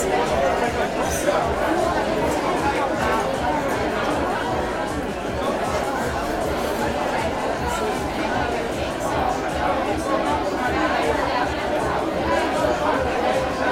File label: Cafe